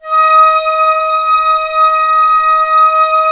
ORC D#5 PP, Orchestra; Sound On Sound - Peter Siedleczek's Orchestra

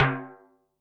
Timbale, Yamaha RM1X

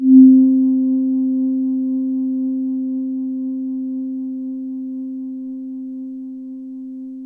Sea Level, FM; Polyend

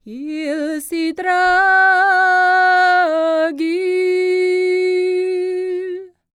SERBIA, Vocal World Voices SERBIAN

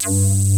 FILTER PLS BS; Pro-Rec - Synthsonic; Z1 TECHNO

